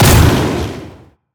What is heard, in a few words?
impaling charge; LoL; hecarim